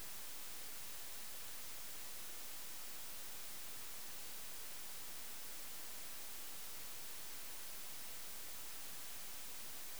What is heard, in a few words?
980Hz 8 bits unshaped dither